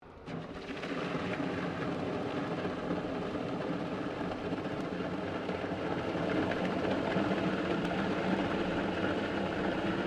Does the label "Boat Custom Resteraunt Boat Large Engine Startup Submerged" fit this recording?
Yes